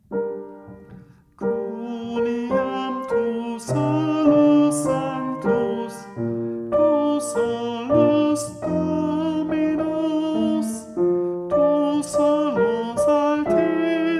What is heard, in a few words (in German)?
Sopran